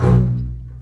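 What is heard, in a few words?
basses B1 marcato short; East West - The Ultimate String Collection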